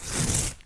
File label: Paper Rip Sound